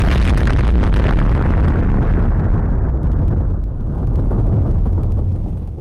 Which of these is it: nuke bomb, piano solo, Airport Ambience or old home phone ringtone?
nuke bomb